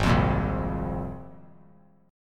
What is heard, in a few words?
Ab 6th Add 9th Ab; strummed; JGuitar